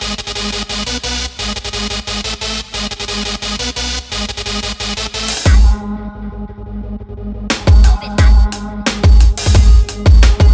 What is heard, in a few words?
Gabba Hop 88BPM